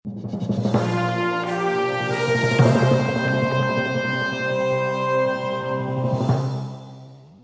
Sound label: win; Muzyka i dźwięki z gry